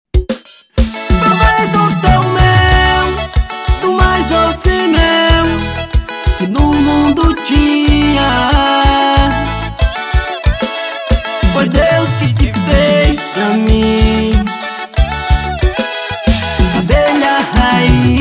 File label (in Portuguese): forro abelha rainha